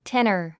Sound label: tenor